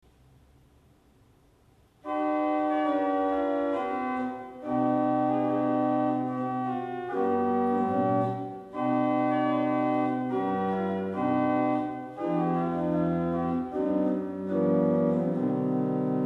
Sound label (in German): Princ 8 HW, Orgelstimmen